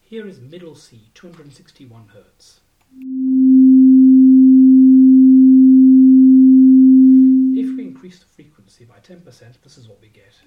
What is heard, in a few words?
frequency difference